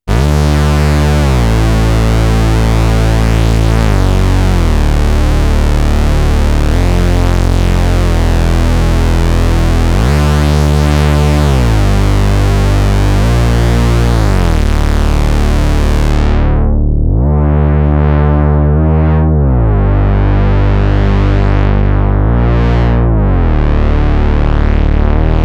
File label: Fake Reese